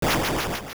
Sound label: Genie Fireball; Genie throwing a fireball